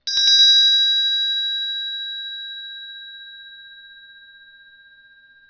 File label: handbell